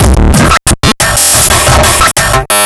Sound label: Brutal Funk, Drum Loops